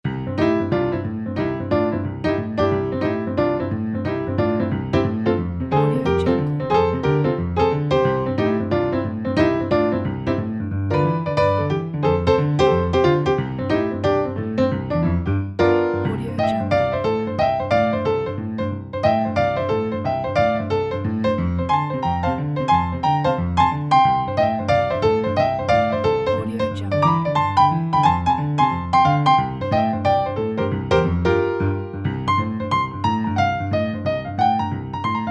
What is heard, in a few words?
boogie